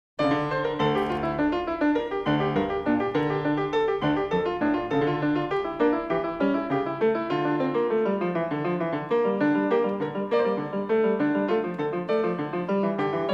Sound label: Prelude No. 6 in D minor, Bach, Well-Tempered Clavier; BWV875